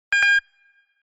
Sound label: computer expansion